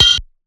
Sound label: blacksmith, black smith sounds